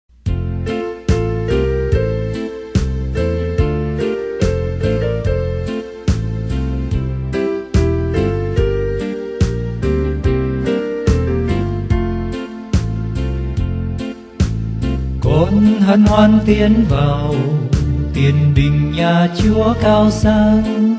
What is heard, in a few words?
BASS